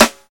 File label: sparkle snare one shot g key, steel snare drum tuned to the G note; snare drum samples